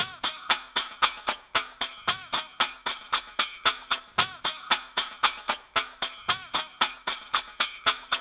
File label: cymb think; M8; percussion and foley